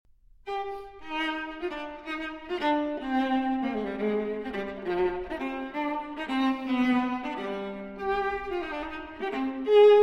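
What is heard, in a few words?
Cello Suite No. 5 in C Minor Transcription For Viola Gigue; J.S. Bach; BWV 1011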